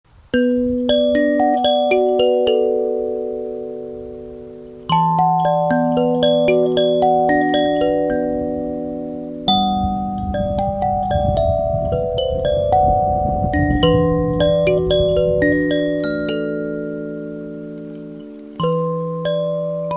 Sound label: 21tone Eminor Kalimbas; Kala; BolfKalimbas